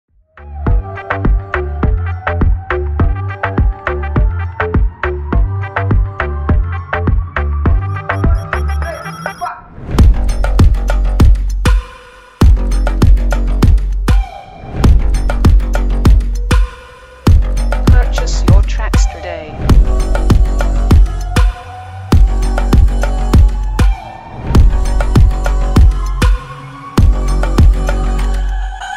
Remix Instrumetal

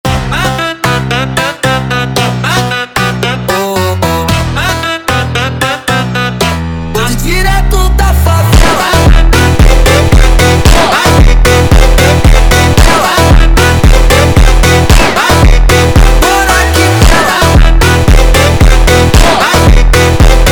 Phonk Montagem Slowed

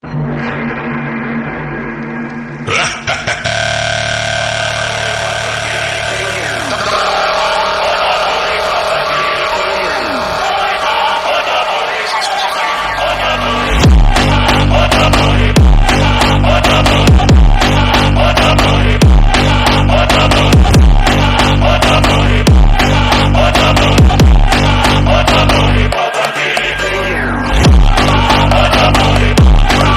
Ukrainian Cossack Phonk

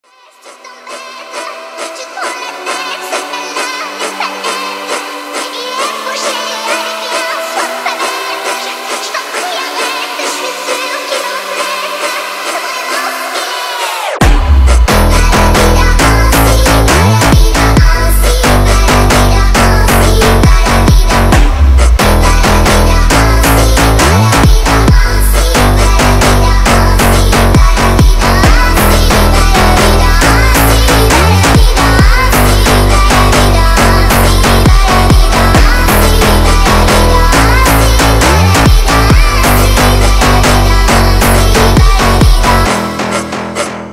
Phonk Funk

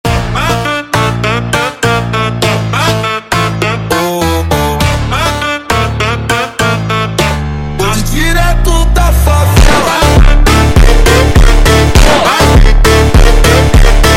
Phonk Montagem Super Slowed

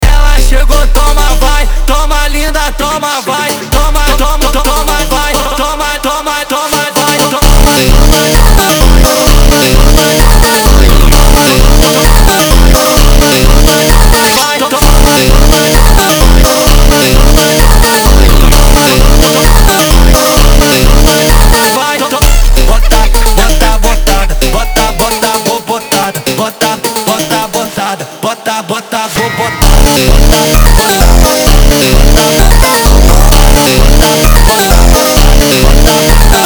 Phonk MONTAGEM

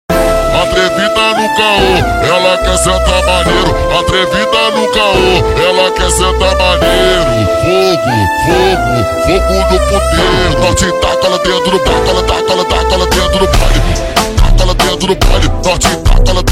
Phonk Ultra Slowed

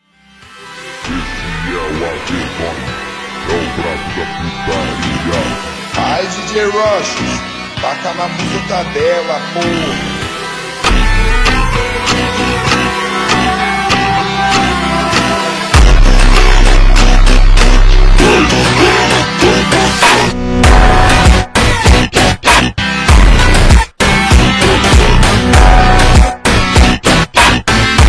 Phonk MONTAGEM Super Slowed

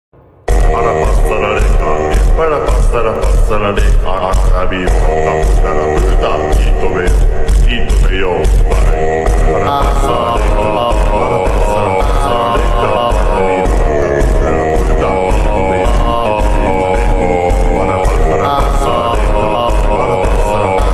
Vocal Slide

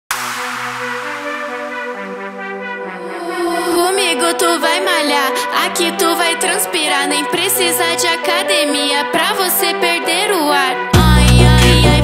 Phonk Automotivo

